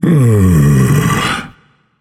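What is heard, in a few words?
non-verbal sigh; smite; atlas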